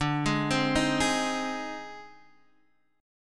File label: Ab Major, D, guitar chord; arpeggiated; Ab/D; JGuitar